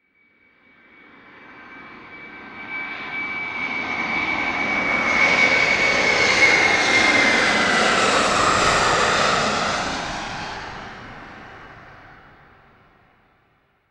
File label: transportation aircraft commercial jet pass low external; sound effects one